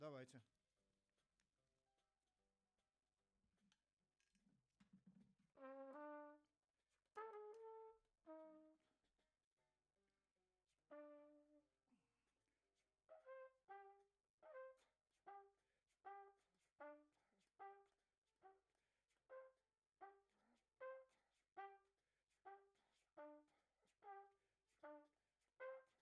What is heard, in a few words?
Trump